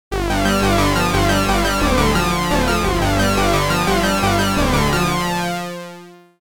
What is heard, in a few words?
Jupiter 8 sync poly; opx pro3